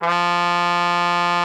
F3, trumpet; Xsample VOL-3

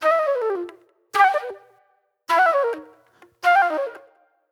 Sparse Flute

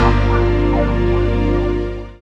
SYN DANCE; Sound & Vision - Gigapack II

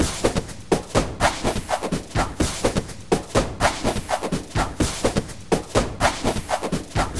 5-4 LOOP, DRY; Bolder Sounds - Granular

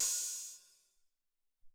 O Tek Hat, Alesis SR-18

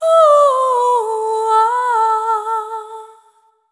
Devotion, vocal samples; Sonic Foundry (Sony Creative Software) - Pocket Diva World Class Vocal Samples; Op-Erratic Loops

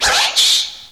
FRESH SCR, DANCE PERCS; Crypton; DrumBase5000

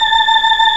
Organ, Barton Tibias

